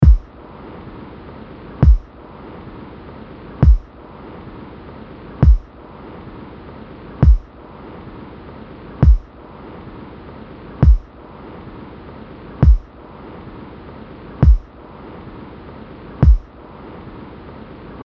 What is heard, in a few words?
Groove